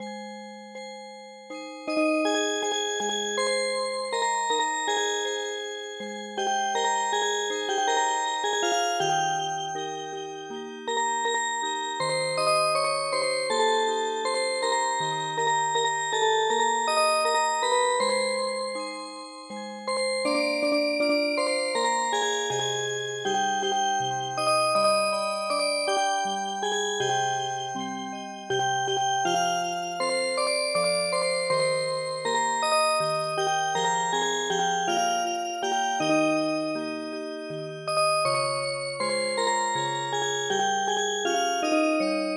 Music Box

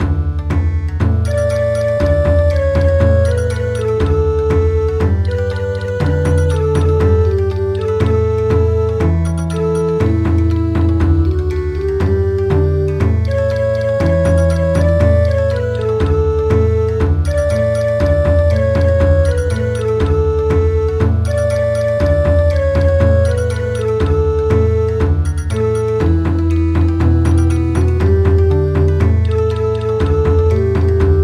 Bon Odori, midi

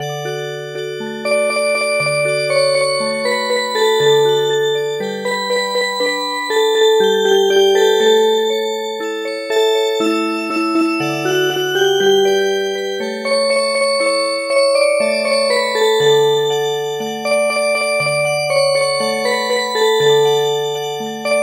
Music Box, midi

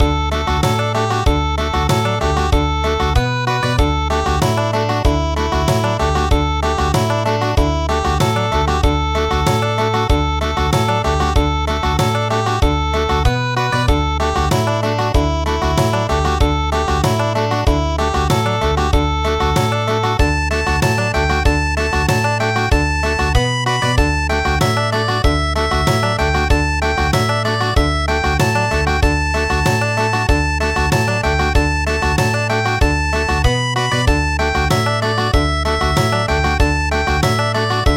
Japanese Processional Tune Country

